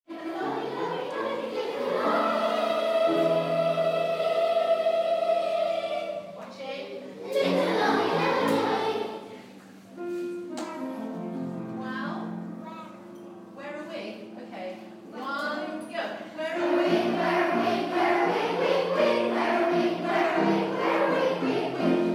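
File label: stretching our vocal chords this morning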